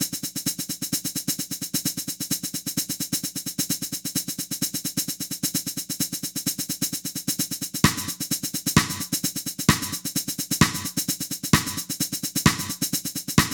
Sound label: Drum Roll